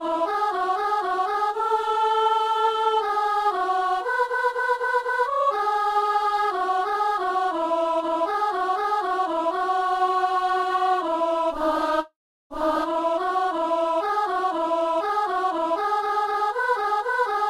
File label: soprano; Comment ne pas te louer; Messe du 17 janvier à Saint-Denis